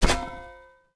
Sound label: pipe drop dirt; objects; Star Wars Obi-Wan; XBOX